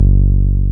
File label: TB303, TB-303 BASS